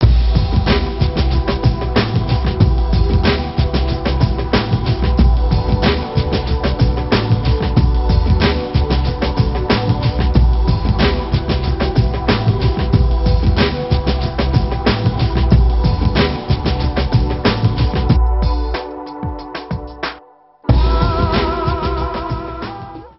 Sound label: instrumental